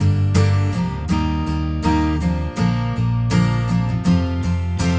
music 16kHz

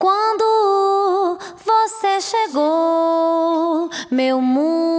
music 16kHz

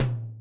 surdo; beatroot

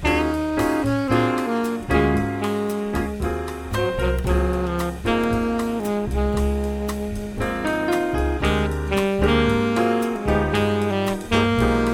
jazz

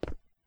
footstep; simscene-py

